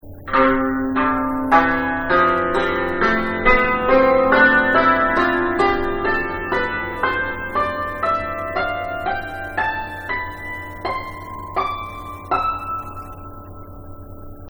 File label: Sample Tam Thap Luc gamme